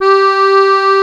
accordeon; East West - Global Sounds

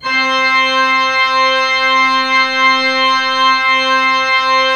Orgue Eglise Full C5, Pipe Organ Full

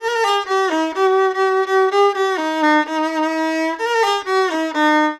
VIOLON, ArabianTraditions; USB Soundscan vol.43; AKAI

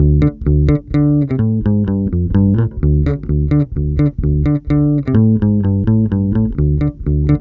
BASSE; USB Soundscan vol.32; Roots Disco; AKAI

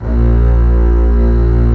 ARCO BASSE; E-MU Formula 4000 Series Vol. 5; Protozoa; Proteus2 Instrmt

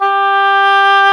HAUTBOIS; East West; Global Sounds

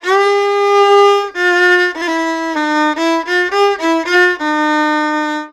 VIOLON, ArabianTraditions

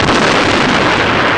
FEU RES, REVOLVER; East West - Global Sounds

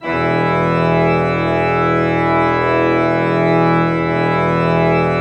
Orgue Eglise Full E3, Pipe Organ Full

